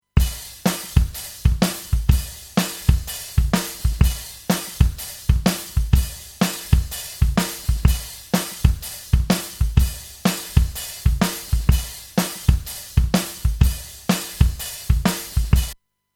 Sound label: Drums Original